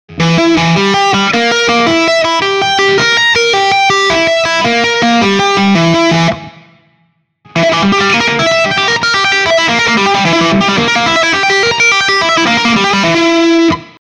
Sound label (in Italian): Arpegio diminuito